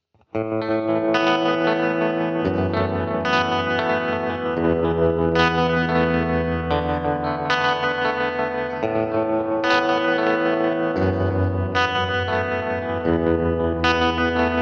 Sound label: Indie Tremolo Analog Room; VALETON Dapper